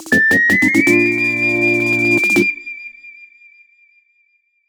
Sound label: Ocarina Get Fanfare; game rip; Legend of Zelda The Link's Awakening